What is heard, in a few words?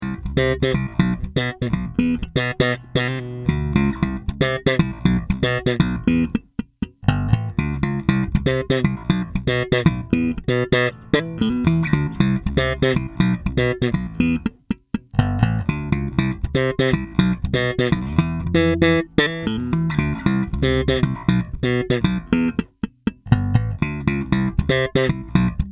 Passif Slap, basses; Sadowsky UV60